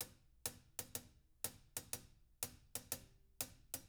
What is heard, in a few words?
HIHAT LOOPS, Living Drums; Sampleheads; Peter Erskine